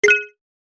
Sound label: Christmas game menu ui select